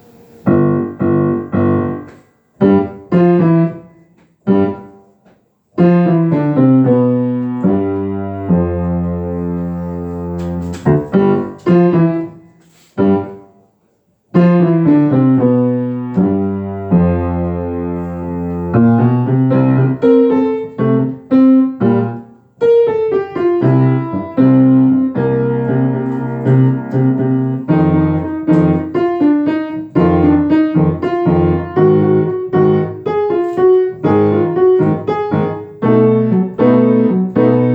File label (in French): melodie